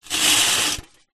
household bucket metal old rusty drag concrete; zapsplat

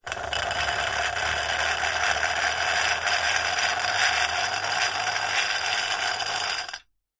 household milk frother electric on run dry off; nespresso

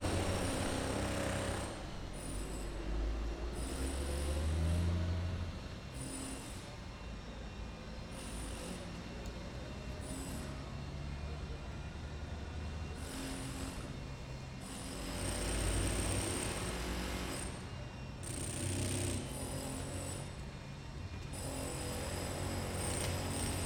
Ambience Construction Site; Just Ambience; soundbits

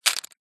leisure poker chips handling short; zapsplat

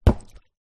leisure inflated beach ball bounce water

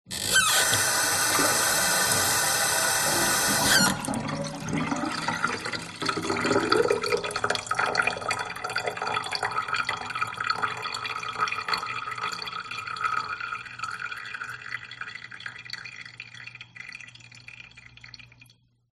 tap water; fox audio

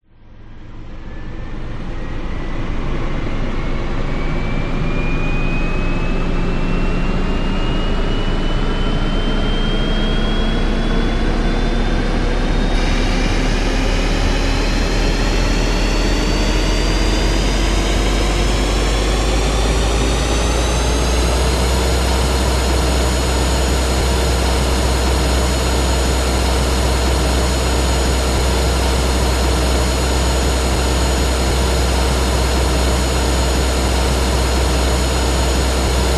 science fiction spacecraft start up; zapsplat

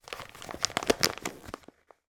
foley magazine roll up; zapsplat

